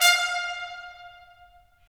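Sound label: brass trumpets staccato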